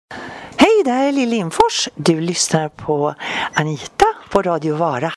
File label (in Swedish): jingel